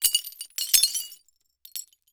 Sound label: Impact Glass Debris Fall To Cement; Impacts